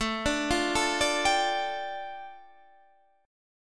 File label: A 7th Suspended 4th Sharp 5th, A, down